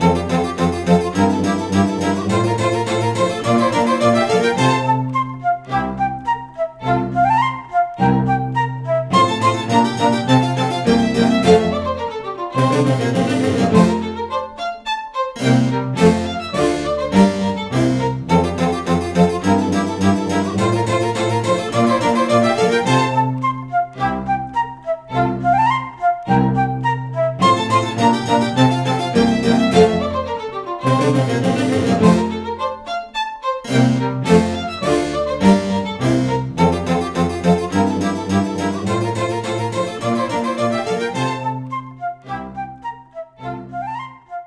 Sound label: Parisian Palace (Win); Cooking Dash